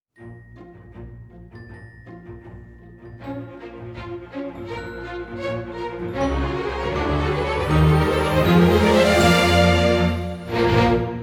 Resonance (Strings Variation); Symphonia Original Soundtrack 2024

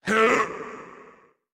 Effort Small; Going Under

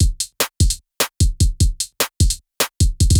Loop 150BPM

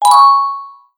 Coins; Wild West Shootout (Arcade) (gamerip) (2019)

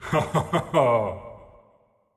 Laugh; Marv

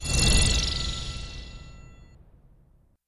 Enemy Appear; disney star smash android ios mobile gamerip 2020

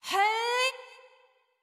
Charge Wind Up; Jackie

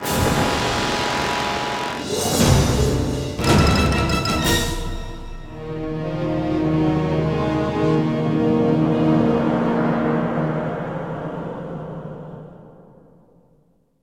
Combat Lose; The Hobbit; PS2

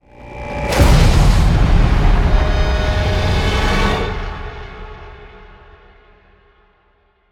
Stinger Modulate ramp; extinction deluxe edition; ps4 windows xbox one

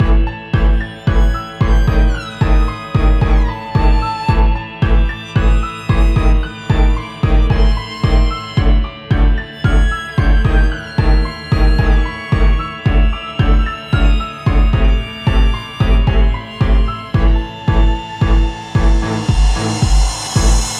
BG Music

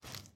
Grab Toilet Paper; Lethal Company

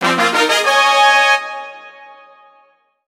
Music event; Power Pro Kun Pocket R

